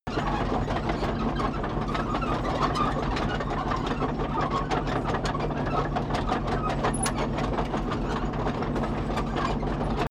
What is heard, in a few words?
tank medium tracks rattle slow